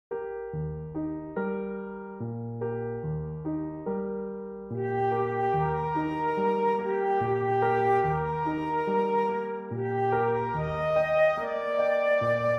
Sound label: lullaby wiegenlied; brahms